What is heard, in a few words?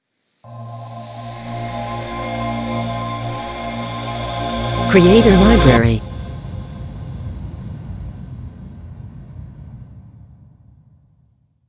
Reverse Opening Logo; Logo-Idents